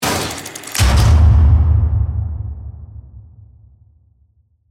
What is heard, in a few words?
Mechanical Gear, Mechanical, Gears, Robotic, Transforming, Robot, Production Element, Imaging Element, Accent, Transition; Spacecraft Sound Effects; Blastwave